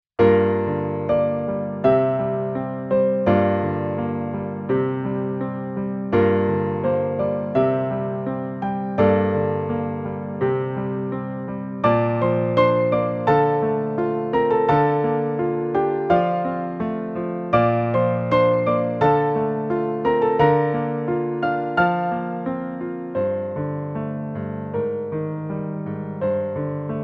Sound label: piano Arr; 7th Dragon